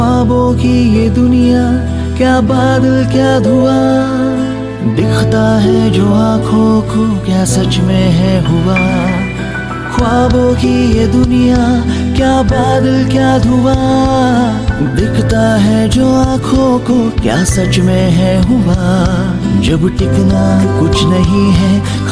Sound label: ringtone bollywood ringtones